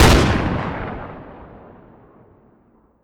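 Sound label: weapon western fire player atmo ext; gmod; viper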